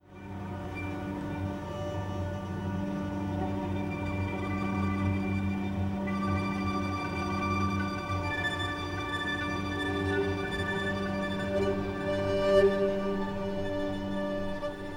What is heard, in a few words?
solo